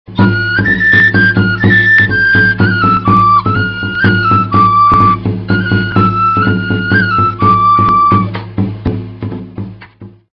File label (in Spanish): flauta